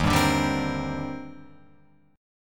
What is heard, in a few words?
Eb Major 13th Eb Guitar Open F; EbM13; strummed; JGuitar